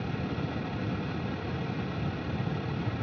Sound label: Ambience Loop, outdoor